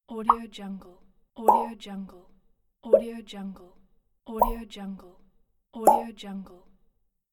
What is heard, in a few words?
plops